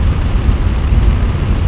air, Weather; Colin McRae Rally 3; XBOX

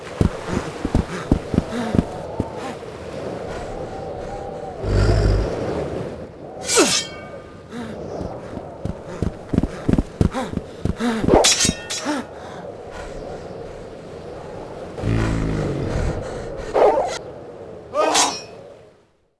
duel spin intro sfx; Sid Meiers Pirates; XBOX

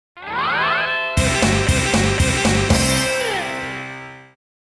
music orb; TyTheTasmanianTiger3; XBOX

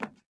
fs glass, footsteps

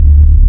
sub, ships engine; Quantum Redshift; XBOX; tara

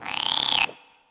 frog; Tony Hawk's Project 8; XBOX; houses

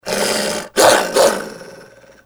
bark, dog; enemy; Soldier Of Fortune II Double Helix; XBOX

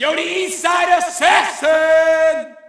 Shout, audience; UFC Tapout 2

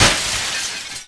window shatter large

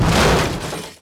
crush, Impact

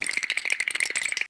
shiver; Crash Bandicoot The Wrath Of Cortex; XBOX

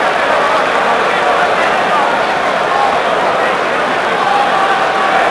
Medium Crowd Idle; David Beckham Soccer; XBOX

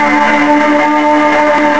engine fast; ships; Quantum Redshift

